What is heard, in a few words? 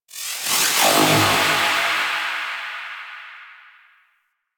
Glyph Spawn; Warframe; Quests; Wraith Quest